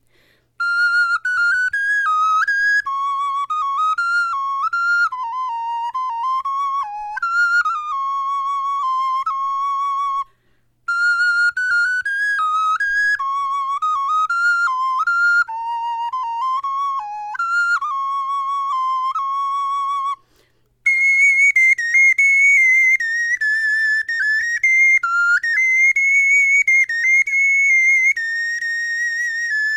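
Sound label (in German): melodie, Knochenflöten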